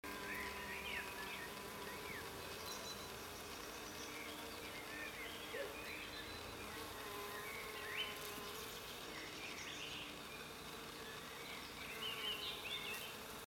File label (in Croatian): Pčela